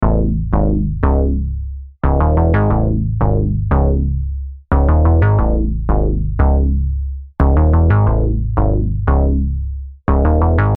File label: BASSLOOP 179 BPM Dbm; Unison Beatmaker Box